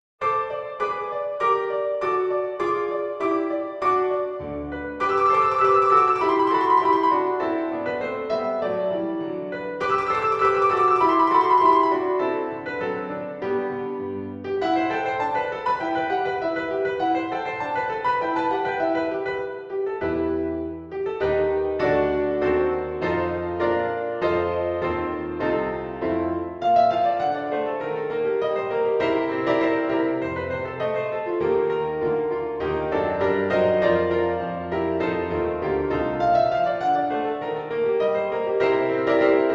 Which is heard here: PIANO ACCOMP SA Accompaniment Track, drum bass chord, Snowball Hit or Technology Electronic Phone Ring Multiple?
PIANO ACCOMP SA Accompaniment Track